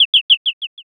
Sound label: sparrow